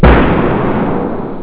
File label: Snap Snare; jazz jackrabbit 2; Hell